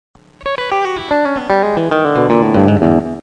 e open, guitar

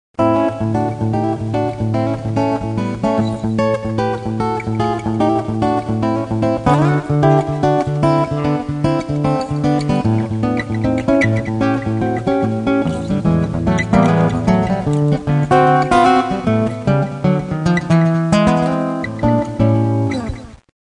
acoustic blues

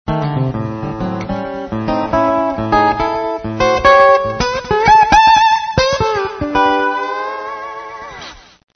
acoustic blues, guitars

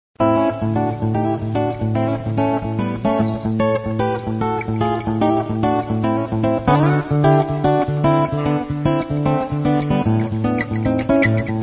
acoustic blues, guitars

